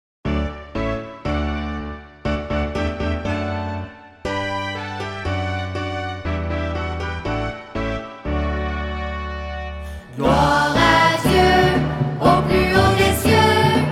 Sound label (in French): Gloire a Dieu (enfants)